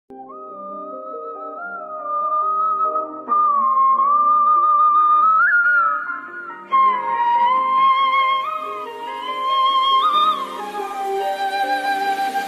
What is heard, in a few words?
love feeling bgm ringtone; gilli